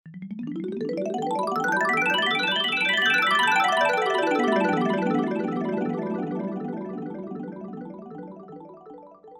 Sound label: Hollow Vibe Sweep